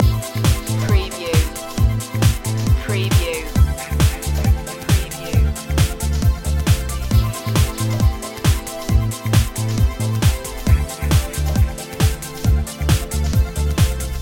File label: smash house loop